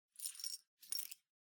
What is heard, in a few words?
Public Phone Tubular Key Noise